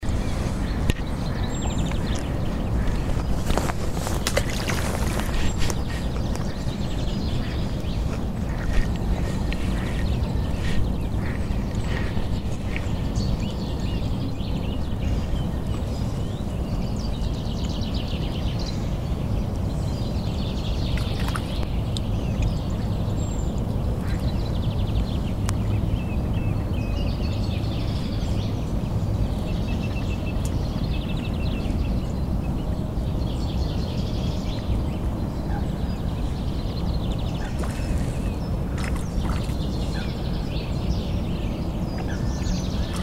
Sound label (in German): seegeraeusche